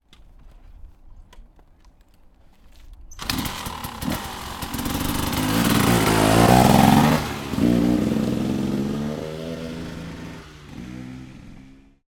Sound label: Starting Bike, Kickstart, Drive Away, Stereo, KTM 250 Enduro Motorbike; 344 Audio